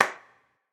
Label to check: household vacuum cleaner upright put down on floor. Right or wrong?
Wrong